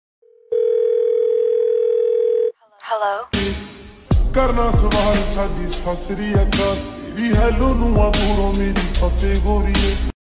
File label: punjabi ringtone